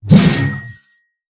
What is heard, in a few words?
swing; smite; chaac